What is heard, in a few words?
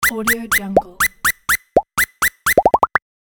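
cartoon squeak pops sequence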